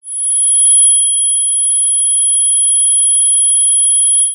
human ringing in ears tinitus short burst

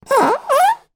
polystyrene block rub finger squeak; foley; zapsplat

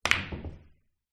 Pool Shot

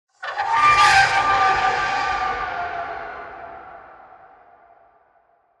Just Transitions Creepy; soundbits

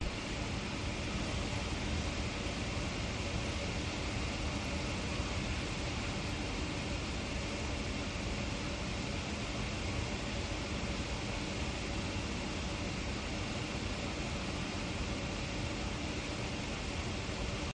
industrial oven fan noise

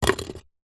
foley brick drop on concrete block; zapsplat

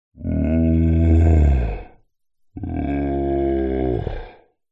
fantasy giant male groan pleasure

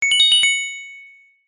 multimedia game sound gem treasure found

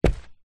footstep barefoot single on worn carpet

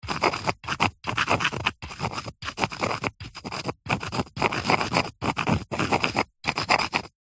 stone small writing scrapes on rock or brick; foley

